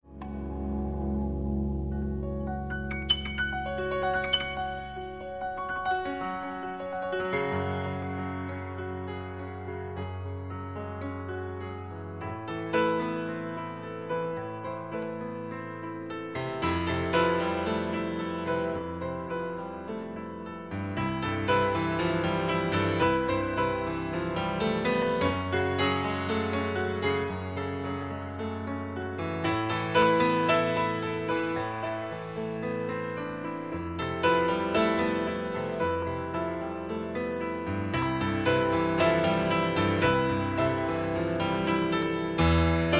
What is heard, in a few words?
Piano